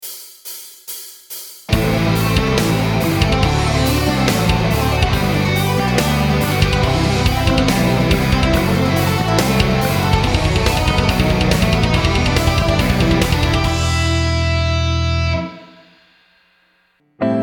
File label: finger tapping exercise 7th Chords Backing Track